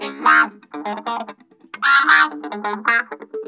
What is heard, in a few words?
TOOTHPICK, Funk Guitar; 90 s Sample CDs; Zero G